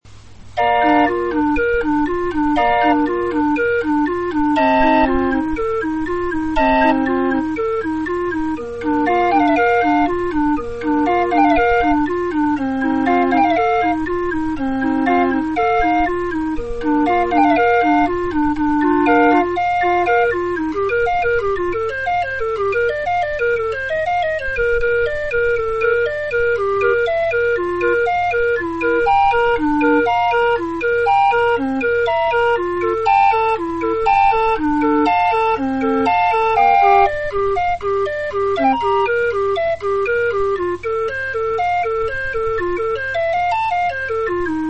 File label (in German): floetenuhr